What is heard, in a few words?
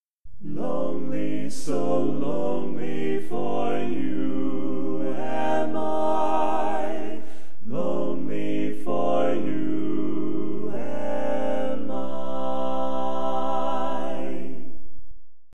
Lead, Barbershop Tags